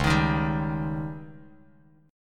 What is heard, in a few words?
Db Suspended 2nd Flat 5th, Bass Standard; strummed